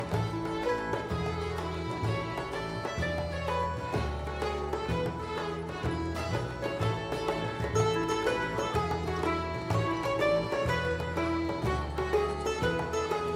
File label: tavern; PhoenixCraft-1.16.5; ambience music